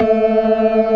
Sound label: TARK, ORGAN SPLIT; InVision Interactive; Keith Emerson